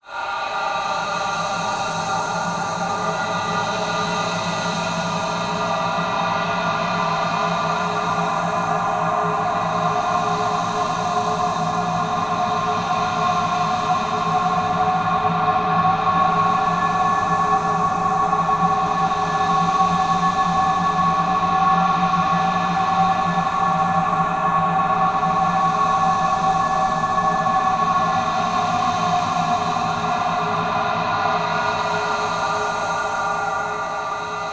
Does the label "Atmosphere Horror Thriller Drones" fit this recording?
Yes